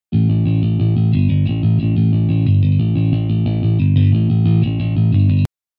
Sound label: bass rif